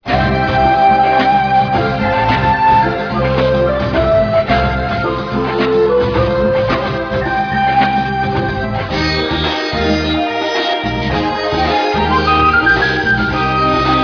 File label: music